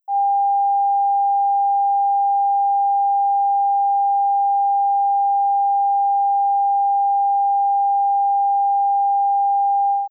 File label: 800Hz